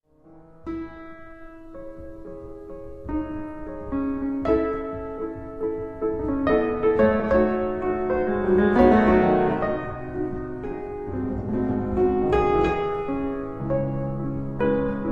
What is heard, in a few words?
Etudes for piano in C minor